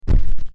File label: stomp